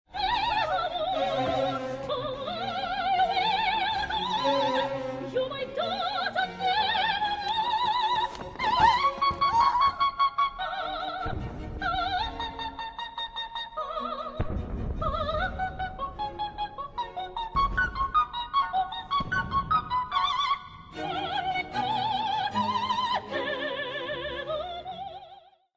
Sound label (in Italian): belcanto; Joan Sutherland; frammento dell'aria della Regina della Notte da Il flauto magico di W. A. Mozart